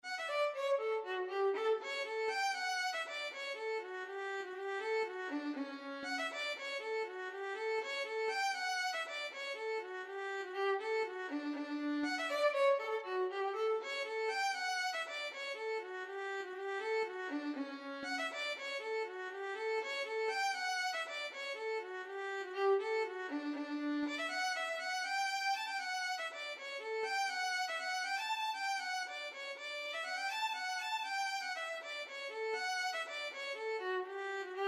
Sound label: violin